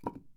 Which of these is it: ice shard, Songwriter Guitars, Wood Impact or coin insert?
Wood Impact